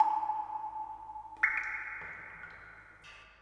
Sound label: ambient water drips; nature; Portal 2